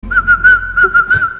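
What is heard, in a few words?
thai whistle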